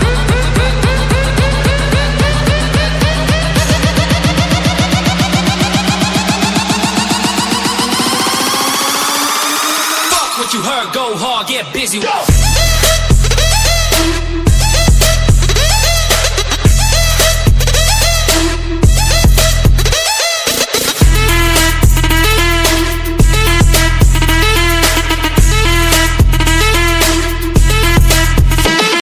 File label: remix ringtone; english ringtones